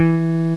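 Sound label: Guitar (jazz) E2; X-Wing; X-Wing Patches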